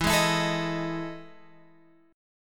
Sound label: E Major 11th chord